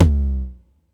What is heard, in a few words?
Tom, Ensoniq ASR-X Pro